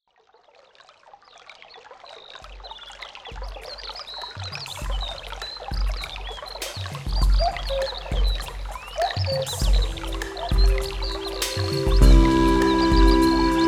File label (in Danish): Filmmelodi Natur